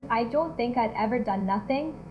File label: beat